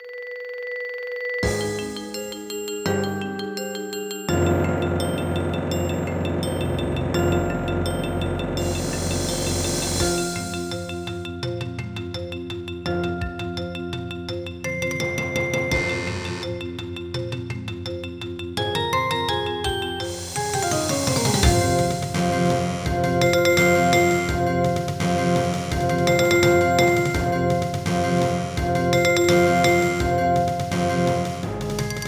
Percussion

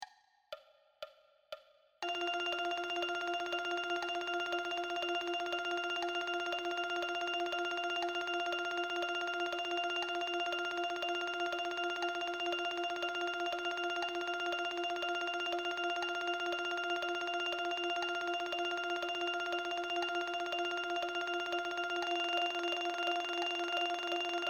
Vibraphone

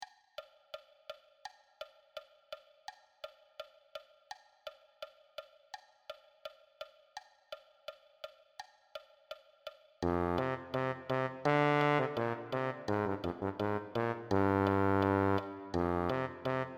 Tuba

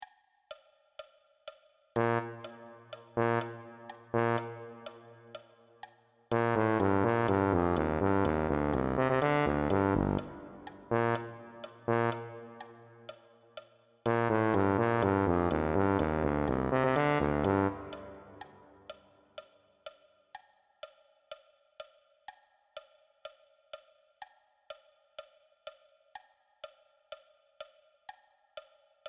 Tuba

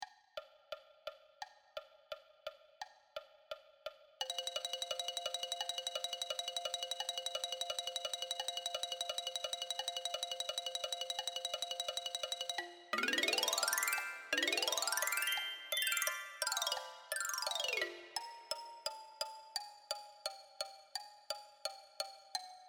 Xylophone

